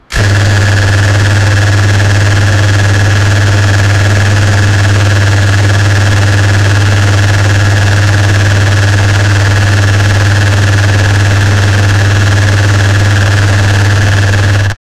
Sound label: the sound of the tiger, engine rev